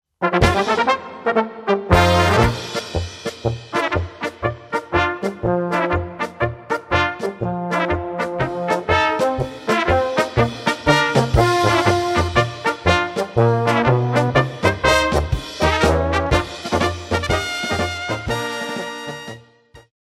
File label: polka